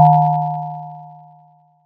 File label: Hydrogen wave decay